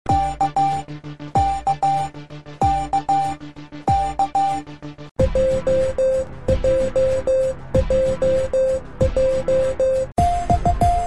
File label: Morse Song Sequence 4-K-J-X-Q-Z